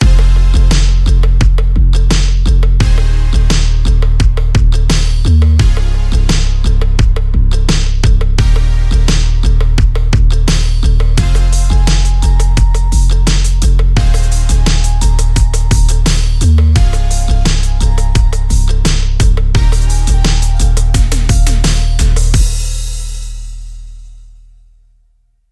Retro Synthwave, ringtone; MIUI12

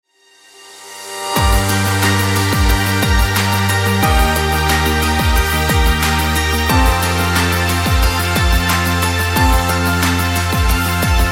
Pop Ballad

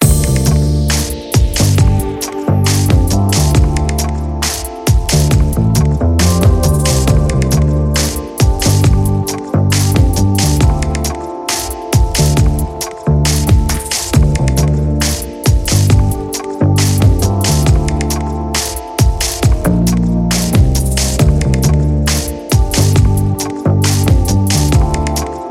Chill Out Hip Hop Loop; MIUI14; HyperOS 1.0

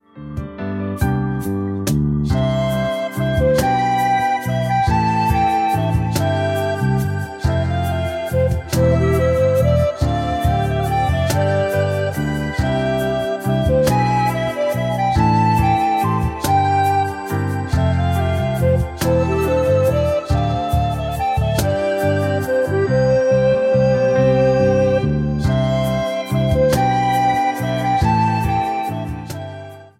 Pop Tune; MIUI12

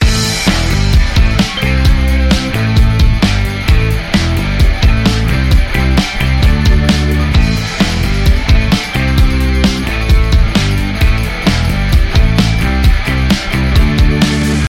Upbeat Indie Rock Loop; MIUI12

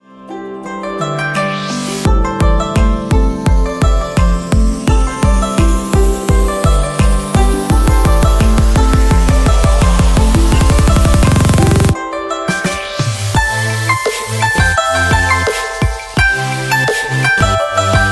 Light China Wind Electronics

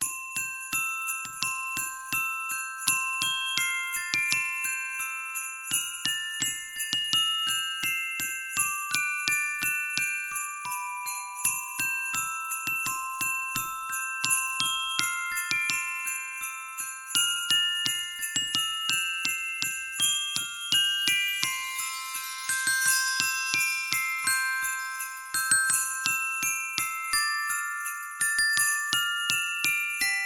Musicbox Christmas, ringtone; MIUI14 and HyperOS 1.0

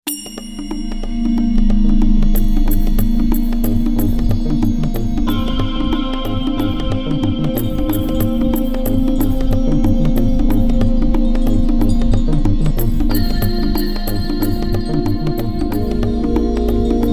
dance, ringtone; MIUI12

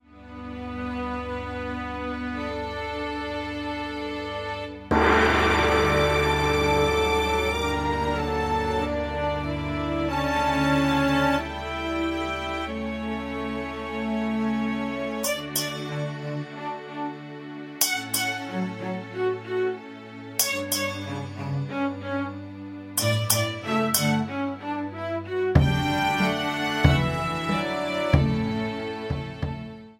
Zen house; MIUI12

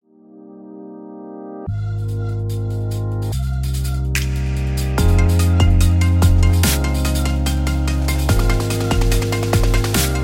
Uplifting Summer Bass; MIUI14 and HyperOS 1.0

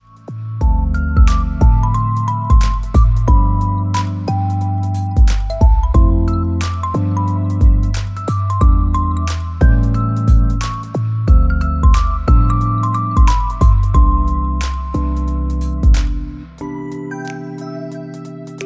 Easy Daily Atmosphere Advertisement; MIUI12

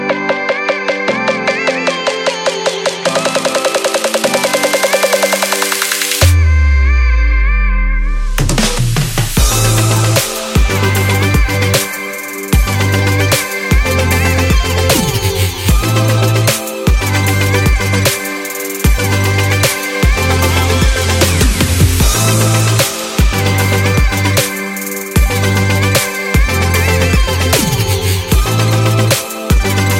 Fashion Energy Future Bass Rhythmic Transition; MIUI12

